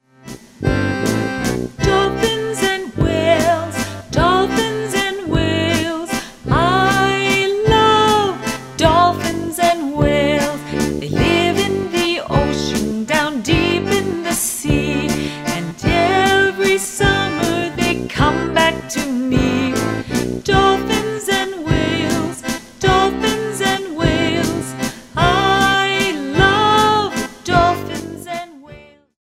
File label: Educational Children's Music; Songs for Teaching